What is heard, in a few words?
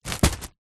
food wrapped loaf of bread down on surface; zapsplat